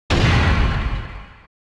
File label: doorslam